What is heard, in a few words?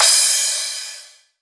Crash, Cymbals